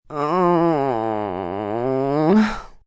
Moan Hungover, Moan, groan, female, whiney moan, pain, agony; Groaning Sound Effects; human voice